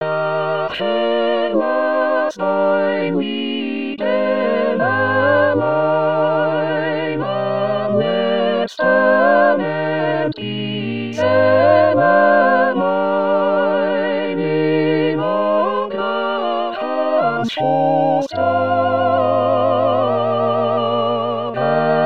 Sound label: Tutti; Bach BWV 245; BWV 245 68